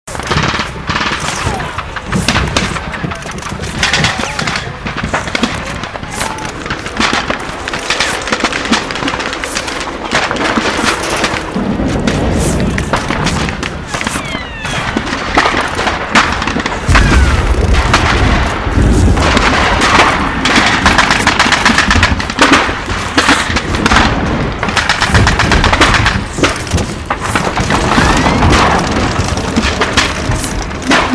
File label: Amb Battle Beach